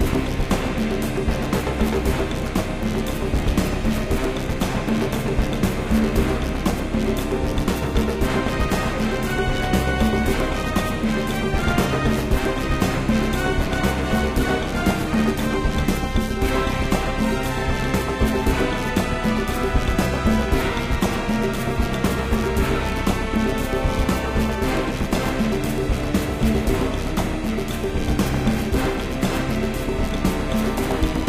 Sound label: music theme; Secret Agent